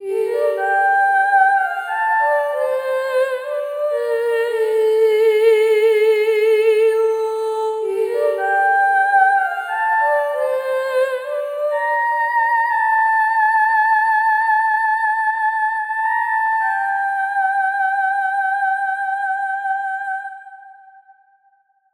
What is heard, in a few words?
vox, 142bpm; terranigma